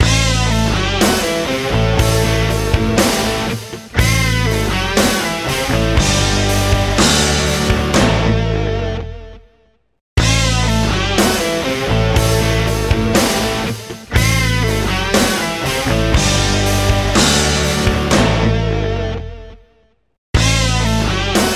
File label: Jingle; NASCAR 2006; psp